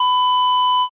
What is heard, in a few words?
censor beep